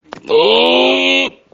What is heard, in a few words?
burp ohhh